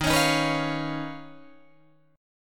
D sharp 7th Suspended 2nd Sharp 5th Guitar Drop D

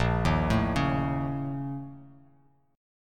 A sharp Minor Major 7th Double Flat 5th; arpeggiated; 6,6,7,6; JGuitar

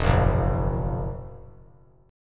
E Suspended 2nd Flat 5th E down; strummed; JGuitar

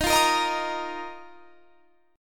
D sharp Suspended 2nd; D#sus2; strummed; 23,20,20,20,23,23

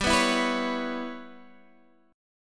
G 6th Flat 5th, Guitar, Half Step Down; G6b5

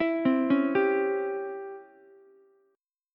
Db Minor Major 7th Flat 5th Db down Ukulele

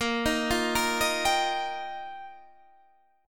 F 9th Suspended 4th Bb, chord

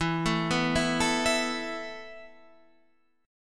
E Suspended 4th, Guitar, Standard; Esus4 arpeggiated; 12,12,9,9,10,12

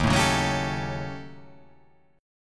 D sharp 7th Sharp 9th Flat 5th

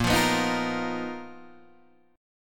B Major 7th Flat 5th Bb, chord shape

